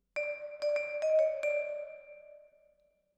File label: Vibra Close, Jazz for piano